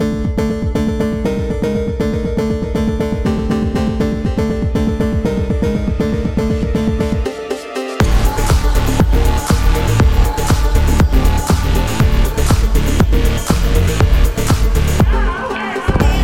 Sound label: Phonk